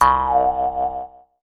Jaw Harp Wow; Roland BK-5; Percussion

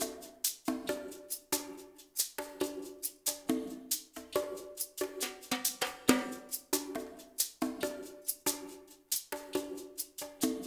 PRC MIX; Spectrasonics; Liquid Grooves 1 & 2

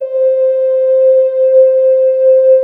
SYN, 170 BPM; Ueberschall; Drum & Bass Flash Trackz

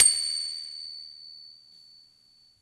CYMBAL; Funky Ass Loops; East West

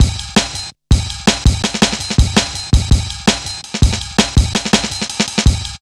165, drum loops, drum & bass

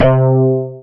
BAS FM DX-7

